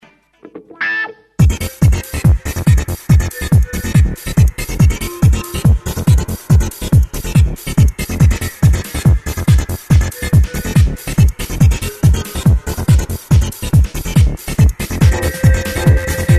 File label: Wah-Wah guitar